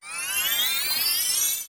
Warp Portal In; OOT

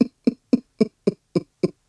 Chuckle; Ghirahim chuckling (shorter); Ghirahim's brief encounter with Link in the Ancient Cistern; Skyward Sword

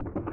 Windmill Inside; Zelda; OOT

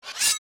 Sword Pedestal Slide; Master Sword

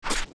Steps Sand Jump; Jumping and landing on Sand (young); zelda; OOT

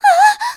Gasp; Zelda gasping (cornered by Yuga)

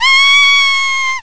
Girl Scream; Girl (same) screaming

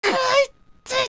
Landing; Zelda; OOT; Adult Link; realistic reaction when taking fall damage

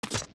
Steps Dirt Land; Jumping and landing on Dirt (young); zelda; OOT

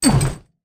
Lantern Swing; The Lantern being swung

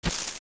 Deku Scrub Step; zelda; OOT

